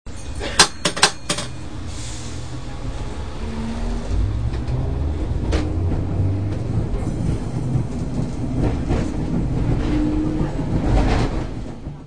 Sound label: bells